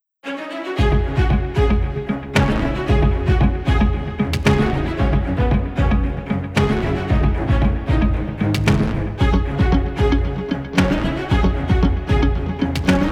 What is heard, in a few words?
Political Way, NEWS BEDS